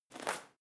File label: medicine ball throw